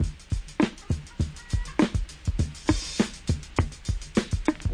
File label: 101 bpm, dirty, drum loops and breaks; BraveStrong